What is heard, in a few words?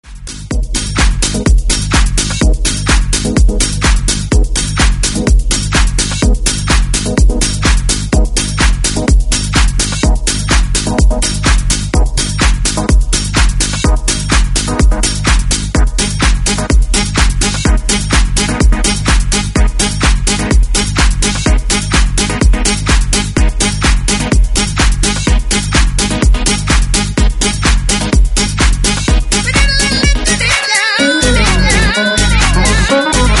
Blow Your Sax